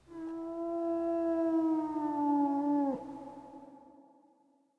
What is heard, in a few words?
village